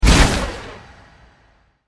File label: spell double shot; archer